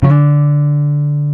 Jazz Guitar

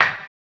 HAMBONE, Vocal Blues Soul; Spectrasonics; Planet

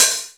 MPC2 OH2, Underground Hip Hop; USB Soundscan vol.02; AKAI

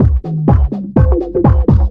snorkel; Zero-G Deep House; 125bpm

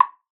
WOOD B, PERCUSSION; Best Service ProSamples vol.42

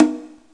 D&B Bongo; neuro

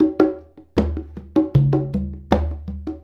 Congas; Essential Percussion

